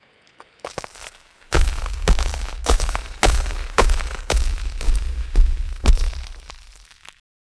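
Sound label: heavy stony monster walking; monsters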